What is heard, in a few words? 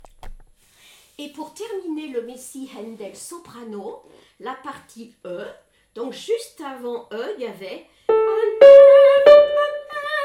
Soprano; Hallelujah Handel; Ensemble Vocal Chanteval